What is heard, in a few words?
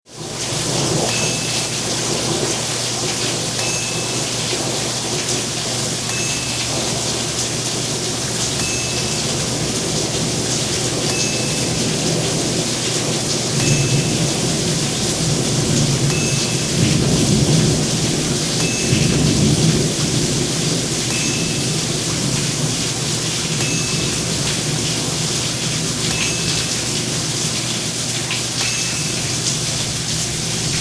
Rain Thunder